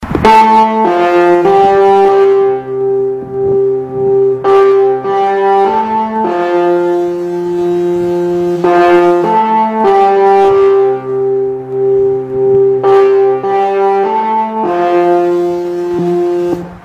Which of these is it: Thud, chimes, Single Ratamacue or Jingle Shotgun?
chimes